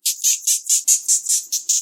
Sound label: moth laugh; Voice Moth